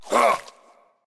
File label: hitreact small